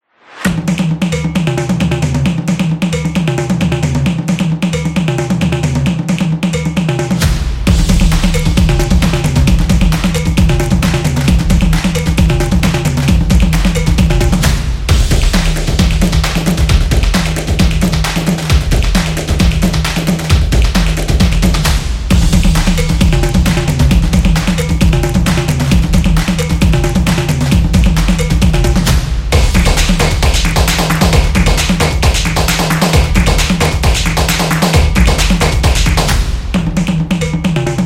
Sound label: Rhythmic